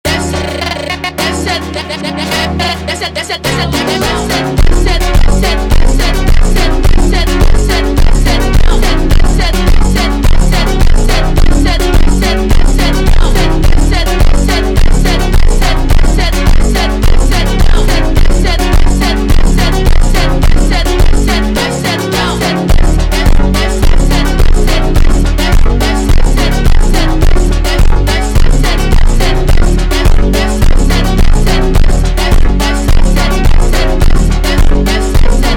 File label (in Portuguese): Montagem Vozes Espaço super Slowed